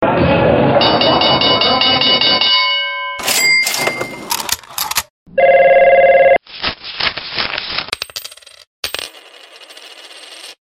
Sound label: business sounds